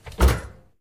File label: fall against table, foley; video games; source engine; ep2; eli